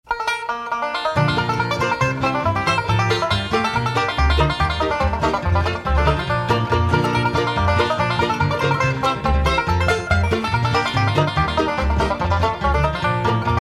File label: Sailor's Hornpipe